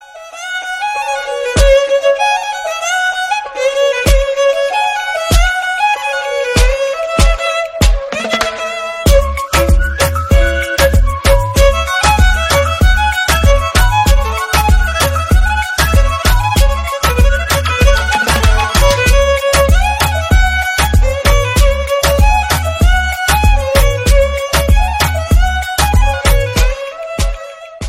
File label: Rumba Song Instrumental Ringtone